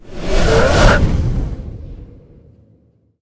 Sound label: prepare blind, illusion illager; mob; Minecraft; Conquest Default